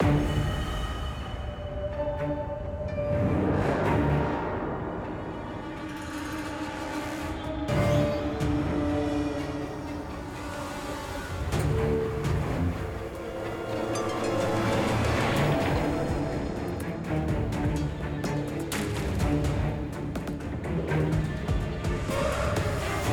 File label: Battle Demon castle